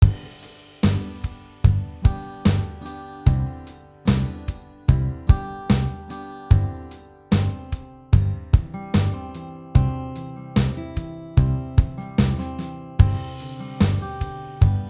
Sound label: Instrumental Arrangement